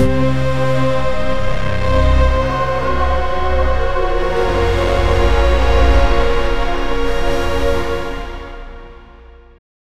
dark orchestral and unset

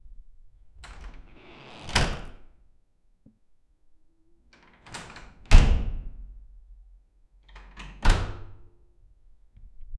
door open

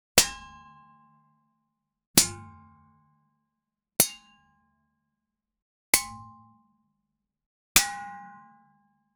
shield block impact metal

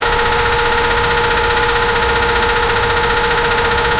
porsche 911, sportscar

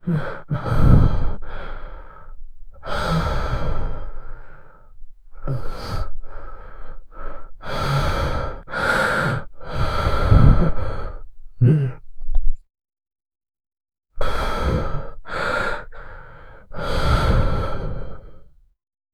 young man breath, breathing

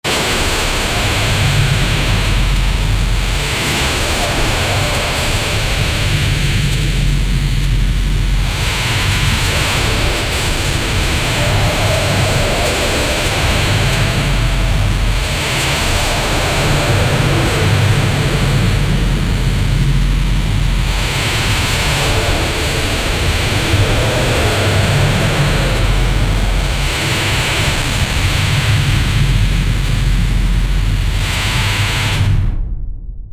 epic guns sound effect

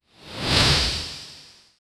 a dynamic woosh sound

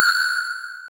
short single soft digital

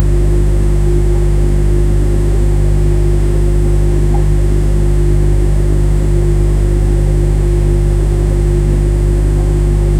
conveyor system, conveyor

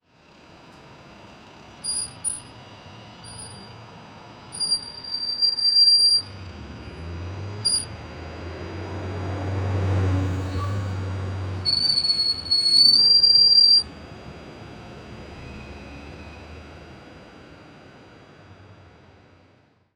car horn 1 car horn 2, busy street

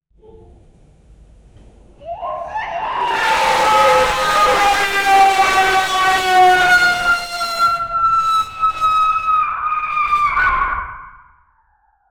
big creature running and, dinosaur

